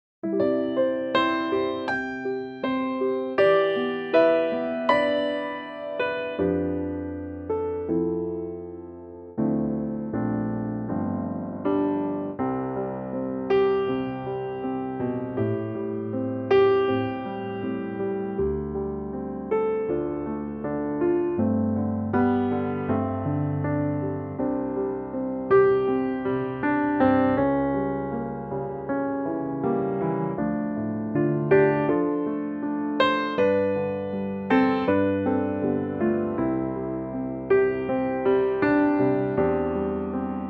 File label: C to Db piano; Standardstrax